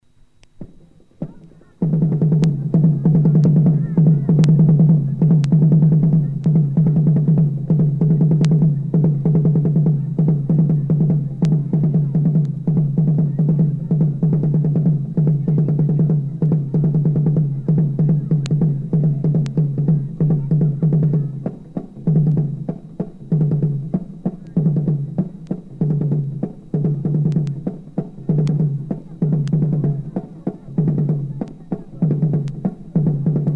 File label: tibesti tardena, tchad; nanjara